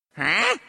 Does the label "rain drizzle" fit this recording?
No